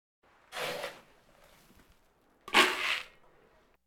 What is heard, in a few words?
Animal effects snorts grunts farm ambience